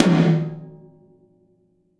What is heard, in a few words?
Tom, Gated Reverb; creative gate; musicradar